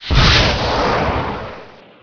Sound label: Phoenix Fire, Weapons; Heretic II Demo